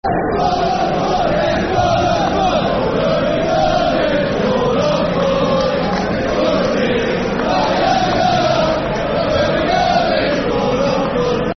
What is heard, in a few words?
Football Chant; Oh Birmingham; Aston Villa; Aston Villa Avfc